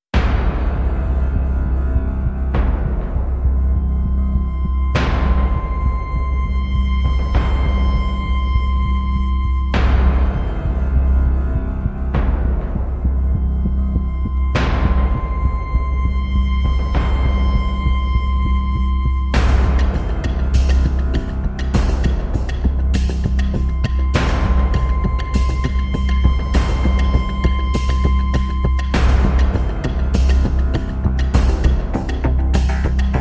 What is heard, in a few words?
Rhythm